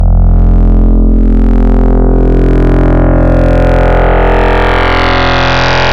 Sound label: wt bad day, wavetables digital